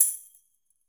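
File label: Tamborim Hit; Latin Percussion; Drums; Drum Hits; Tambourin; Ableton; Factory Packs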